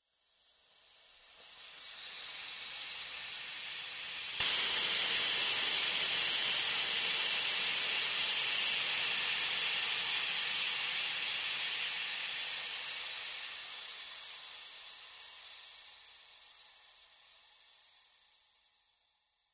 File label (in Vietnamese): Tieng noi ap suat xi hoi nuoc ra